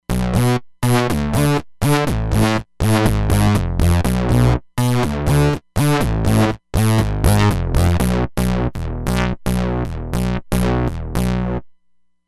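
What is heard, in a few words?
Buzzy Bass, Roland Fantom XR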